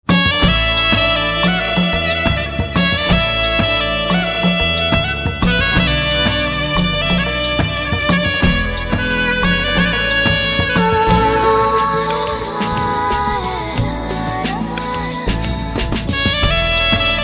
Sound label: Saxophone